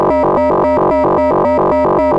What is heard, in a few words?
Moog F#2, Synthesizer; Hollywood Edge; Giorgio Moroder Rare Synthesizer Collection